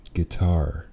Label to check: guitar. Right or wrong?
Right